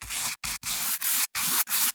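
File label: handwriting watercolour canvas; soundbits-soundfx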